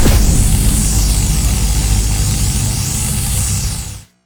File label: laser; weapons; starruler